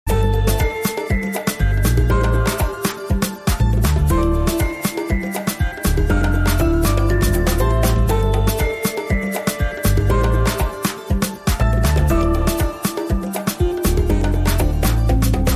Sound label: Smooth Percussive Voice